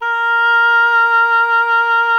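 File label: Wind OBOE A; Miroslav Vitous - Solo Instruments VOL-2; Solo lnst. II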